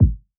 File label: Kick Body